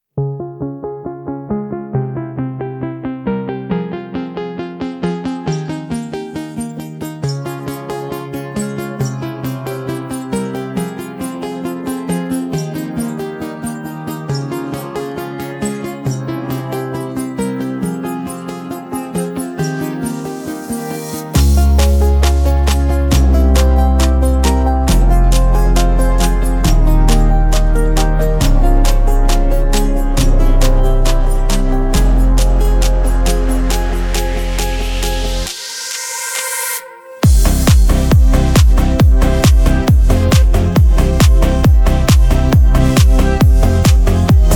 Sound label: kalinka malinka instrumental